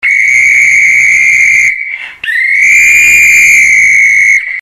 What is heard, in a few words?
thunderer